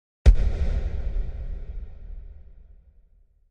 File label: Impact Echoed Thud